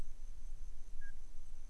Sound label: toot